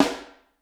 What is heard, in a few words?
Snare Overhead; Ableton Foundation FreePacks; Snare Designer